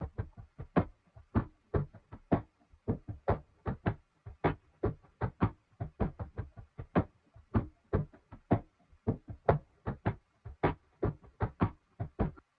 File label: 155 BPM F Min Percs, Loop Stems; Cymatics; 2022 Melody Collection Platinum Expansion; Warped